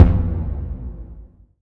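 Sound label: bass drum, left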